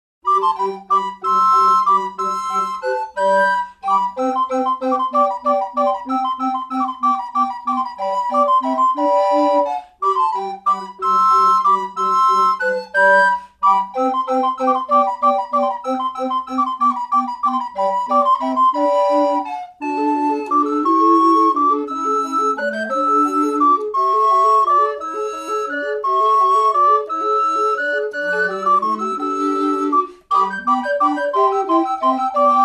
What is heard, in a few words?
Presto